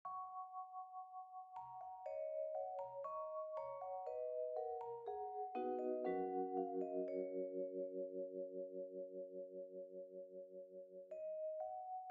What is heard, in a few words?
Vibe Trio; The Girl With The Flaxen Hair; By: Claude Debussy